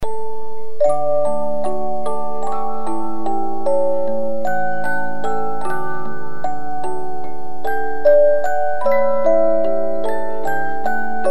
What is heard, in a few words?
Tristesse, 72-Note Movements